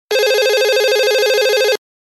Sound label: Old phone